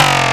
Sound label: PERC FX, Big Beat; Big Beat Samples & More; AKAI